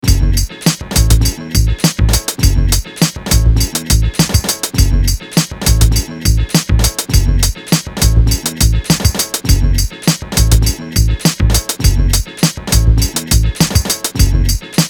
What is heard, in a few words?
pick up beat